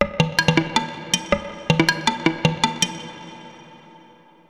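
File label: percussion, ambient, 160; eJay; Drum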